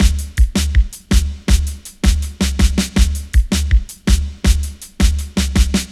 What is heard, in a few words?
Face 162, Jungle Straight Loops; Zero-G Jungle Warfare 1, 2 & 3